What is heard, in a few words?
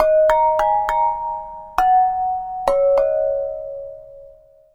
Flower Pot; Ambient; Melodic; Ambient-Melodics